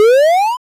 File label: win; flixel; TurnBasedRPG